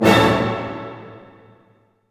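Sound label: orchestra hit major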